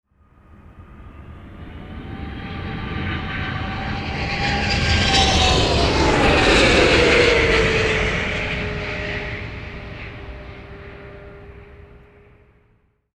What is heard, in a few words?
Jet 777 Pass By